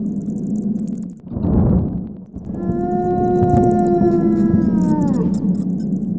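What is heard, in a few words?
HAUNTED; ambient; Lomax; PC Gamer 46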